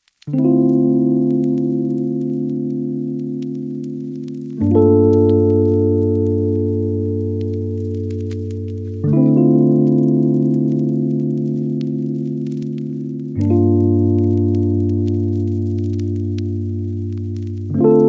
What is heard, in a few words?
Whispers of Rain and Vinyl